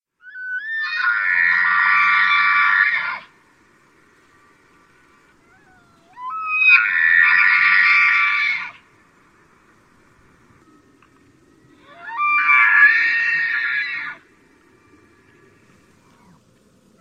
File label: Bull elk call